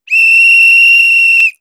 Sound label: whistle; Rasenschach